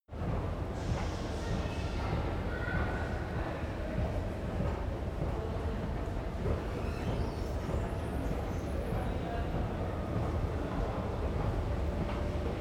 subway station escalator big; bahnverkehr u bahn

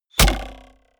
arrow hit; soundpool

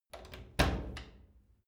wood door old unlock

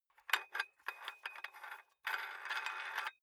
dumbbell close nut thread, fitnessstudio

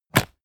mechanical switch wood medium push; industrie-wirtschaft; mechanik

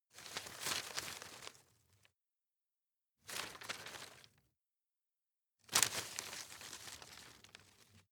rustle medium; foerster

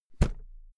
briefcase close damped; industrie wirtschaft; bueroarbeit

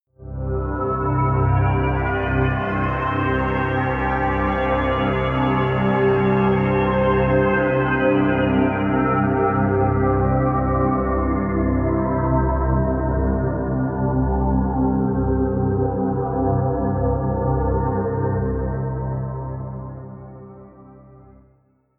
pad sweep turbine; flaechen

